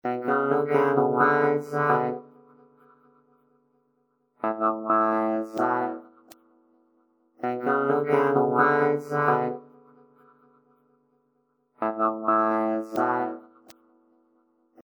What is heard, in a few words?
vocoder